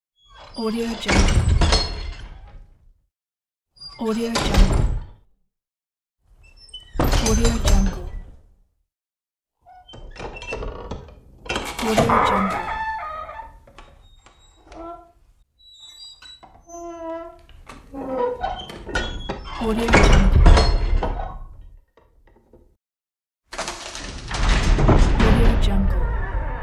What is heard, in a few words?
door to dungeon